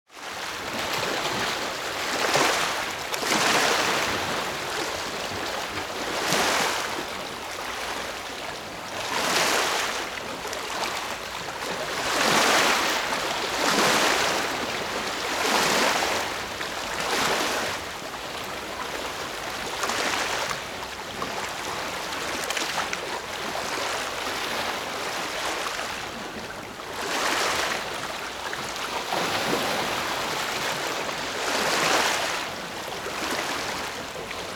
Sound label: Sea Waves